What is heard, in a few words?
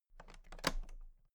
toolbox latches open soft